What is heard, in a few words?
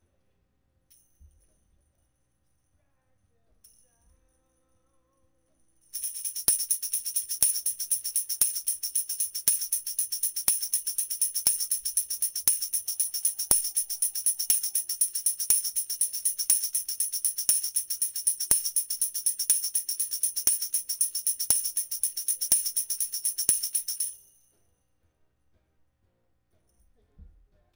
Tamb